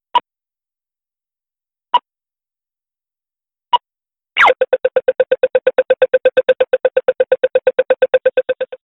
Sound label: ireland crossing